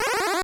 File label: Pain